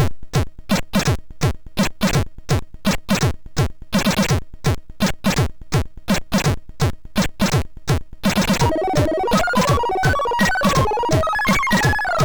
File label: sid MOS6581R3